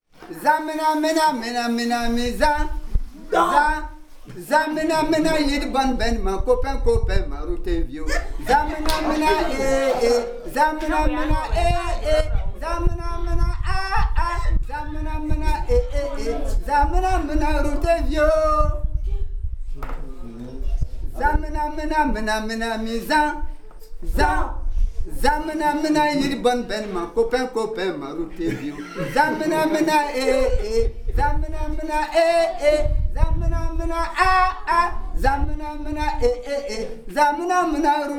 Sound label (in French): chant de scoot ivoiriens; voyage au burkina novembre 2018